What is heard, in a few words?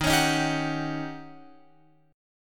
Eb 7th Sharp 9th, Eb, Guitar, Open F; strummed